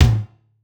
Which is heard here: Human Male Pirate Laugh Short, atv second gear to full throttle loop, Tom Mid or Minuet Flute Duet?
Tom Mid